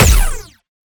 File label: Sciweap Shot Medium Laser Rapid Stun Gun